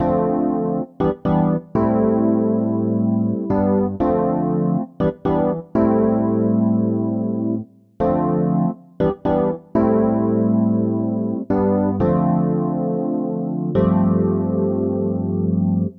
Chord El Piano Am, 120bpm; Zero-G; Extra Chord Progs

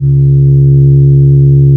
C#, Synclav FM, WIND; Big Fish Audio

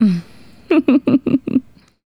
Female Laugh, Hip-Hop and RnB Vocals

